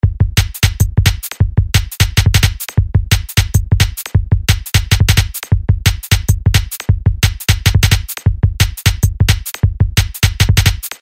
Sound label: 175bpm